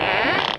porta apertura

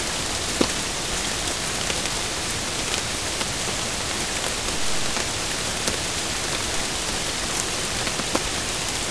Pioggia, natura

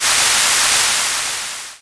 Vapore

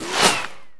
razzo, armi

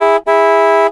Clackson, motori

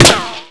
sparo, armi

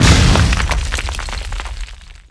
Muro distrutto

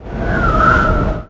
Vento, natura

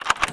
maniglia, porte

